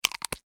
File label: Emergency Medicine Bottle Close Lid Medium; Pops; Objects